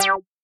synth

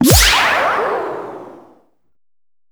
SE FAILED; maimai

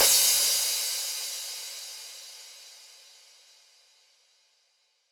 cymbal

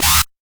bass

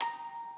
musicbox

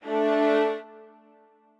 strings

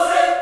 choir

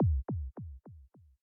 kick delay

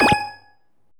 SE LEVEL ADVANCED; maimai

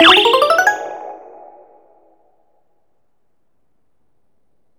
SE MAP FIN; maimai

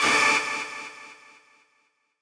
stab

